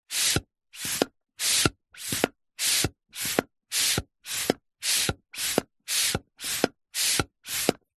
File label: leisure balloon hand pump plastic pumping air inlet slow